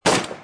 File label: gun Shot; merge-dragons-2